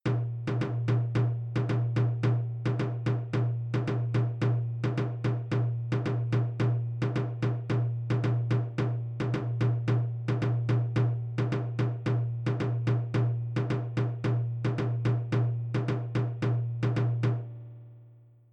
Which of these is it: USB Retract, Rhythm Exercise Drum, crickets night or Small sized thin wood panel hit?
Rhythm Exercise Drum